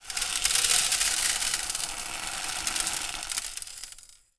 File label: foley small plastic beads pour onto metal tray